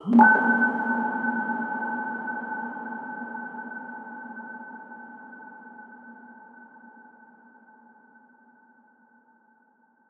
Blip Dropped

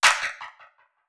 Clap; Ableton Live 12 Lite; Core Library; Drums

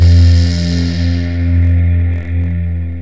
Instrument Beauty Vox; Ableton Live 12 Lite; Vector; Sprites

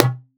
Tom High; One Shots; Drums

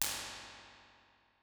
Reverb; Unusual

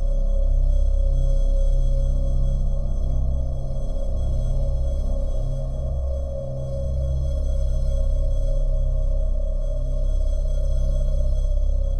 Atmos Dark Bells, Synth; Loops; Tonal; Ableton Live 12 Lite; Core Library

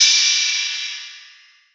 Ride Lofi; Drums

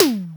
Tom Mid; One Shots; Drums

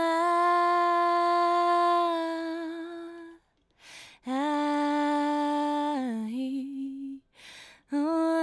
Vocal; Ableton Live 12 Lite; Core Library

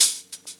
Hihat Closed Loop

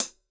Hihat Closed

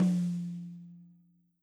Tom Stick Hit Soft Dry; One Shots; Drums

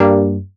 Stab Rit Gmin

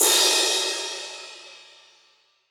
Crash Acoustified Low, Drums, Cymbal; One Shots; Ableton Live 12 Lite; Core Library

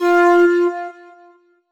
Synth Note Tin Flute F

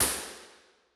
Farmhouse L; Impulse Responses; Real Places; Ableton Live 12 Lite; Hybrid

